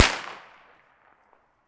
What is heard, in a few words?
firecracker explosion echo reverb city concrete walls